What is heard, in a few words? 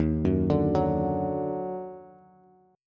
D sharp Minor Major 7th D sharp down Bass; arpeggiated; chord